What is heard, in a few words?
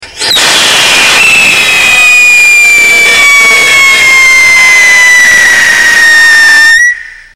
potassium benzoate plain, rockets whistle tests; Mineral Oil; Core Burner